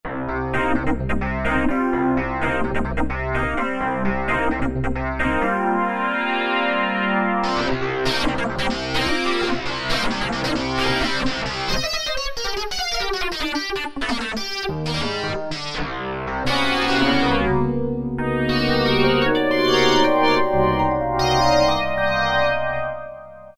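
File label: Piano Tornado EP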